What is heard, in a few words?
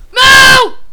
crazy cow; hall of moos